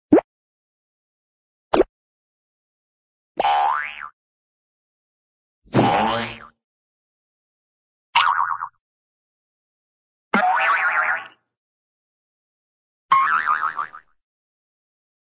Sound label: bloop, cartoon little bloop